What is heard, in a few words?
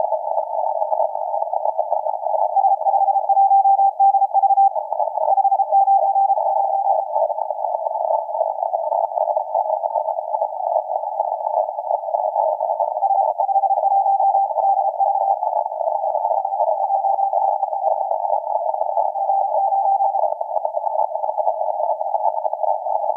12m